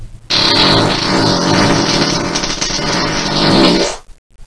fart on dog

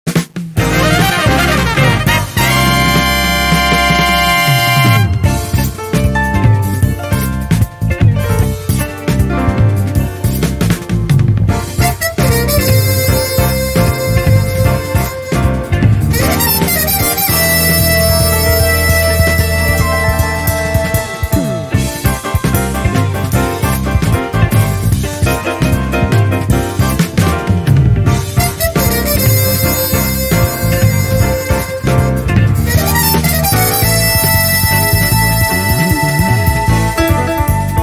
nocturne

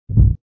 step

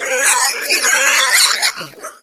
tushkano death

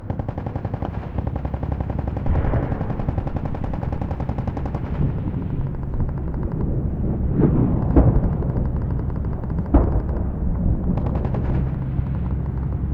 distant war

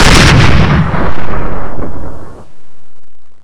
big gun

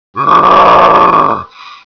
baby garg attack

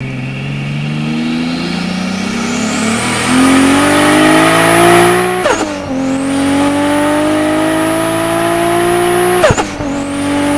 turbo

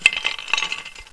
glass, debris

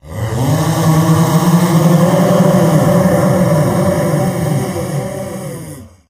controller presence r

